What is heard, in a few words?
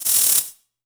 Sperks; Roland SPD-S